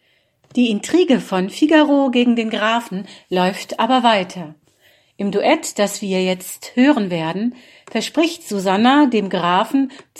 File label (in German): Duett